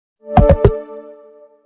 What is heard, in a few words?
Xiaomi, Sonnerie; Pil Bitti